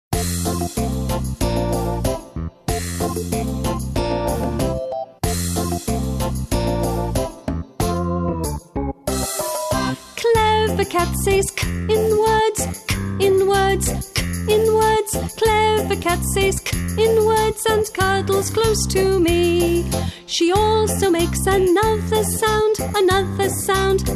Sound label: ALPHABET SONGS; CLEVER CAT